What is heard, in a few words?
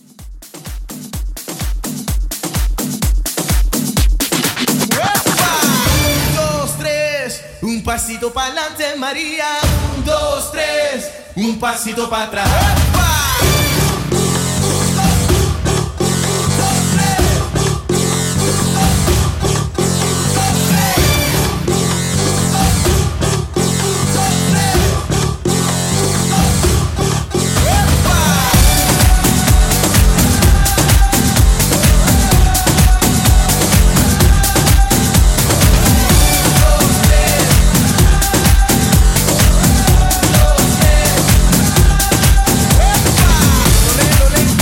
Tribal Re Drum